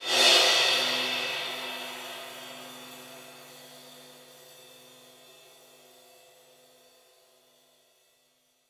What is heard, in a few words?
big Win Transition; imperialriches